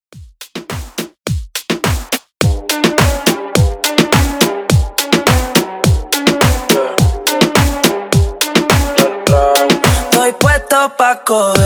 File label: Reggaeton 105 BPM; ReDrum